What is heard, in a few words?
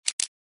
item get